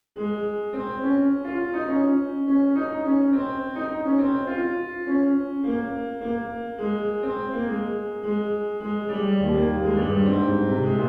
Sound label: fuge